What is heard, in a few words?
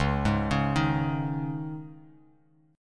Db Suspended 2nd, Bass, Dbsus2 chord; arpeggiated; JGuitar